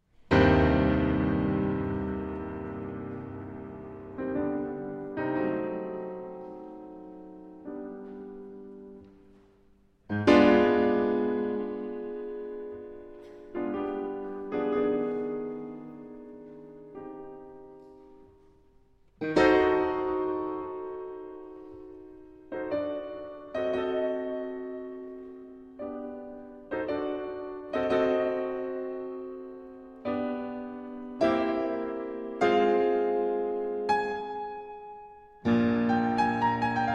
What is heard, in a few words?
Piano Sonata in C Minor; Op. 13 Pathétique